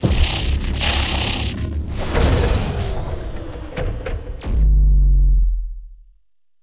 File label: Sound Boss Dead